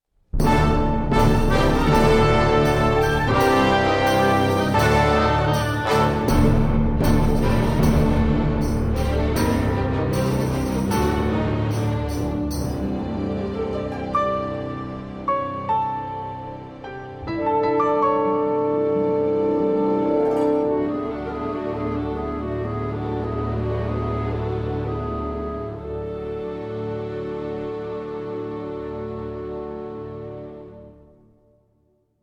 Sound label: Orchestra Choir Tracks Roman Soldiers